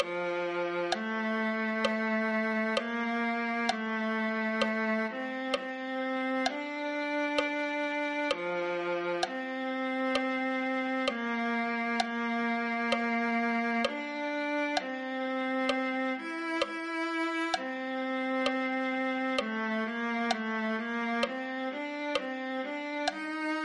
viola

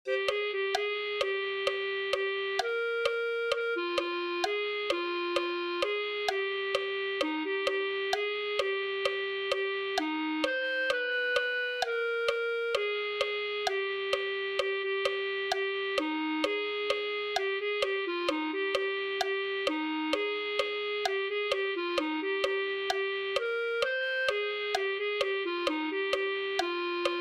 clarinet

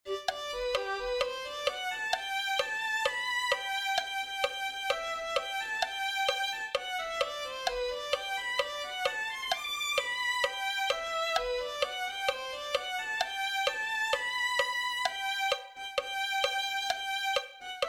violin

